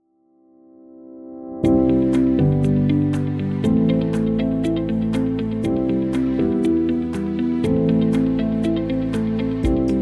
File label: Light Corporate; audiojungle